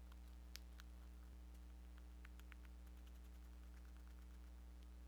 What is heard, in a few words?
Cricket Bruxing Short, rat